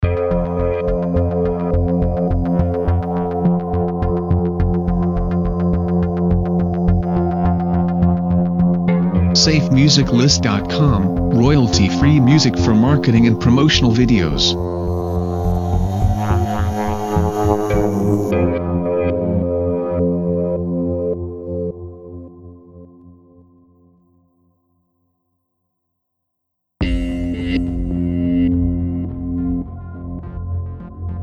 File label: Dark Moon Intros; SafeMusicList